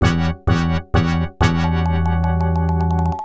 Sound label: Failure; Revenge of Shinobi; Mega Drive